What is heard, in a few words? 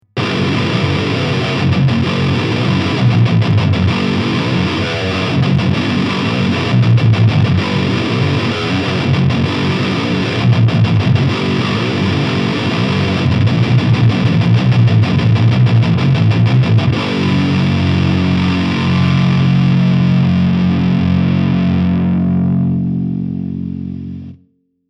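High Gain, guitar; Jackson Soloist 8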